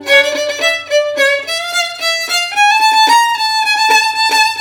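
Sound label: VIOLIN LOOP, Asian Traditions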